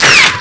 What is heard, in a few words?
Ricochet; RivalBall for Macintosh